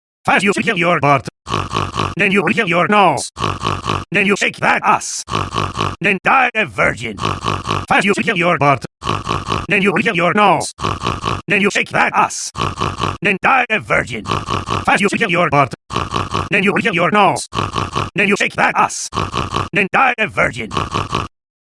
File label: SNORT SNORT SNORT (Acapella)